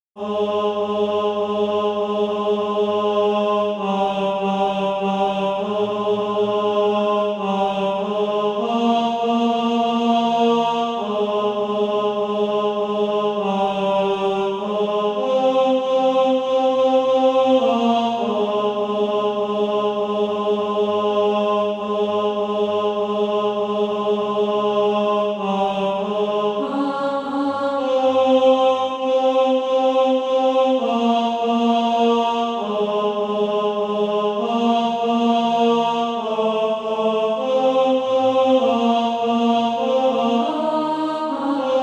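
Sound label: tenor; Down from Their Home on High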